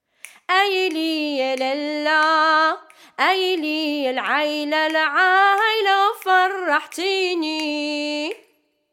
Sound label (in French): FARAHTSINI COUPLET REPONSE VOIX 1